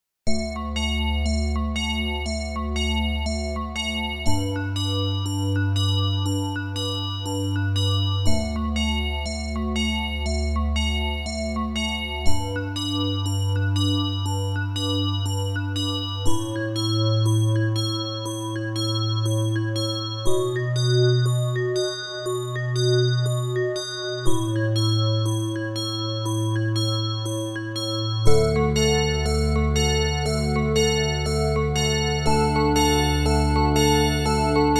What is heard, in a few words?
Bells of spring